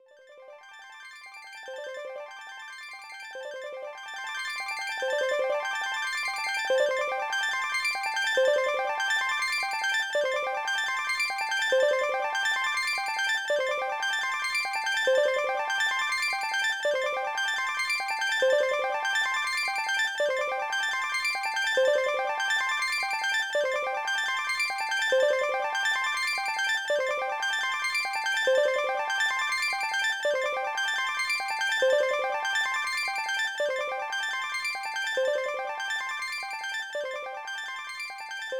BGM Bubble Loop; build a bear workshop welcome to hugsville; nds

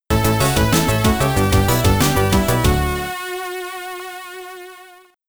Game Over; Stage 7 Game Over

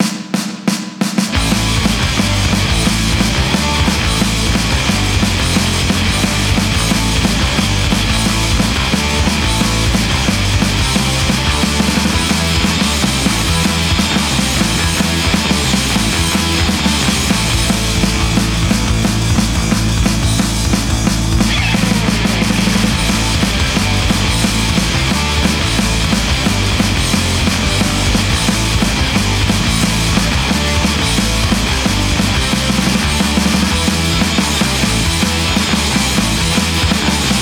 Hardcore Punk; drunken fist 2 zombie hangover; ps4; xbox series xs

